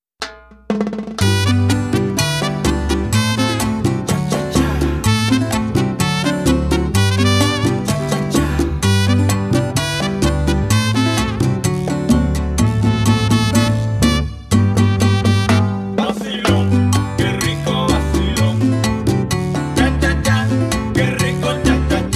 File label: Rumba Mambo Cha Cha Cha